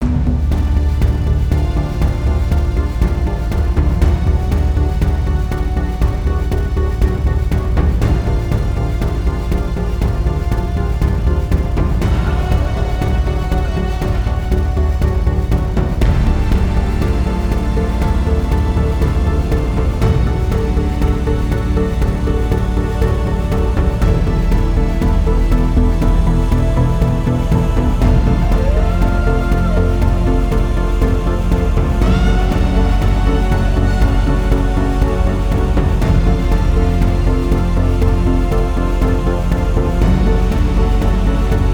Dark Building Tension All instruments Film Trailer; CUES for film TV games etc